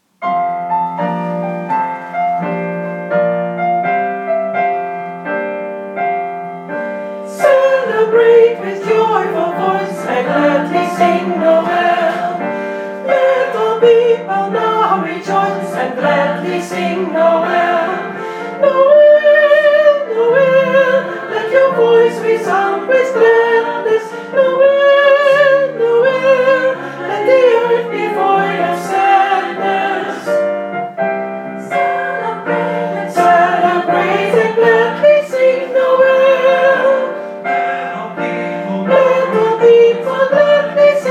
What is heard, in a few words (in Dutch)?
sopraan